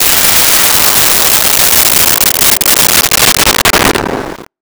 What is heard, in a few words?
Missile, Weapons; 1001 Sound Effects